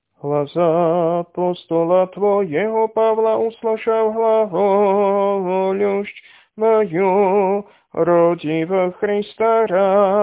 Tropár bláznovi pre Christa, hlas 1; nedelna-skola

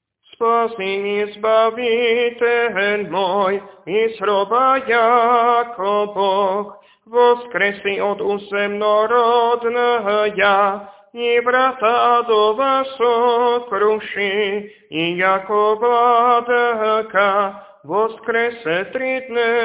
Kondák voskresný hlas 4; nedelna-skola

